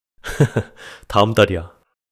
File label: laughter